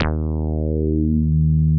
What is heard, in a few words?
TB 303 BASS